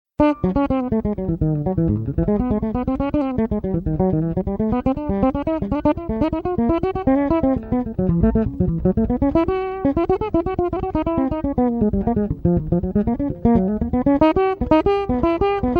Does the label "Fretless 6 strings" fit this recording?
Yes